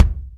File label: DM LOW SOLID, KICKS